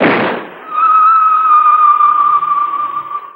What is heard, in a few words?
Ancient Noise FX, Industrial